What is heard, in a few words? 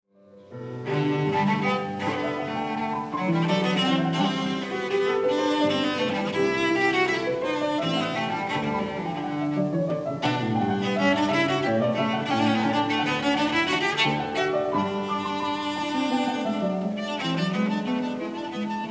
Sonata for Cello & Piano in G; II. Allegro ma non tanto; J.S. Bach